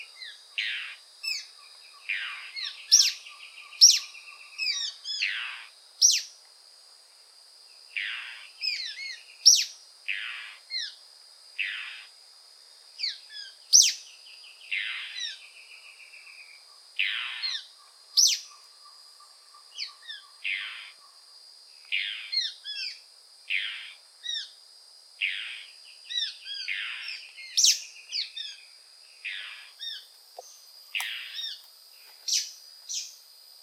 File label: Dusky throated antshrike; پرنده آلاگزنهای گلوتیره